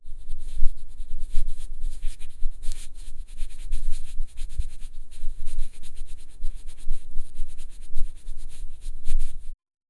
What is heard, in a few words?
realistic binaural asmr, massage